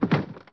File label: frame